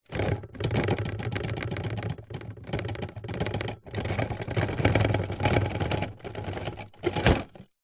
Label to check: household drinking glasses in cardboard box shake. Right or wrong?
Right